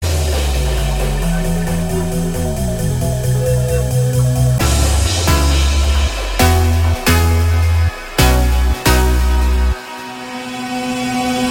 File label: Summer Ringing